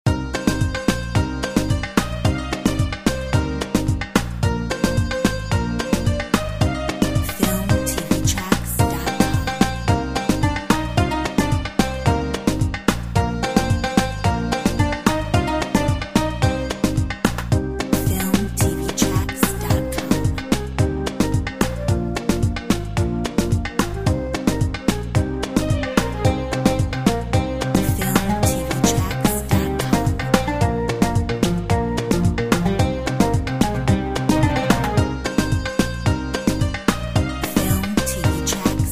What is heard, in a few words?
turkish lokum